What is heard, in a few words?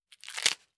Tears Pineapple